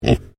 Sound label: Animal Pig Tamworth Grunt; Hamish; Edgar's Mission Farm Victoria; Pigs; Animals